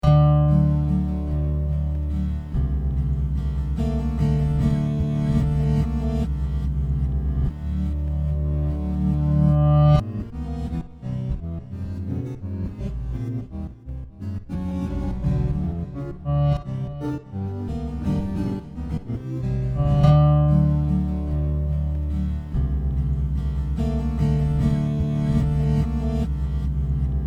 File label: Guitar Dream